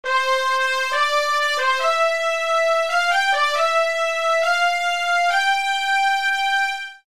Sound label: midi